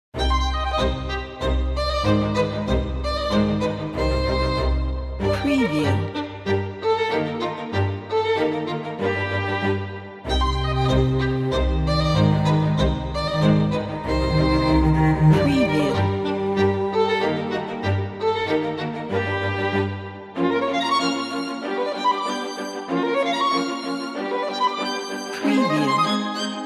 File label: a gentle touch